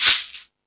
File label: Percussion, Drum Machines